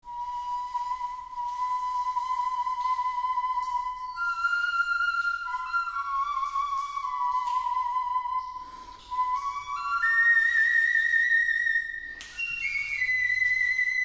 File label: flute